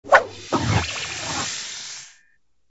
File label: synergy; Toontown Online